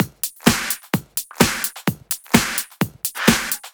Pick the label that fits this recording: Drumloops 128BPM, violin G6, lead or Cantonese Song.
Drumloops 128BPM